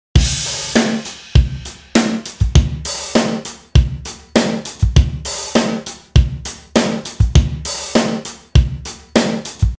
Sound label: 100 DRUM LOOP SIMPLE OFFBEAT